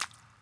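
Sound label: wet walk, footsteps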